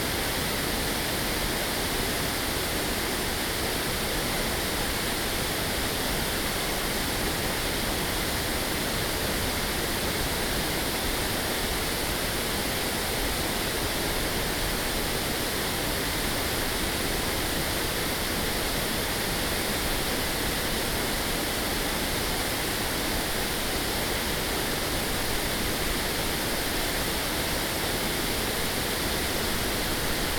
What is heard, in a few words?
Malanda Falls, Atherton Tablelands